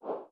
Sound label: swipe right; GoogleVR; ScrollingUIDemo; unity-vr-portoflio